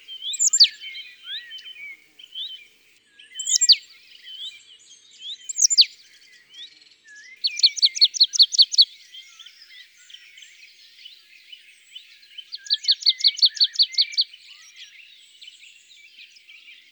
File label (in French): Mesange nonnette ABC des chants d'oiseaux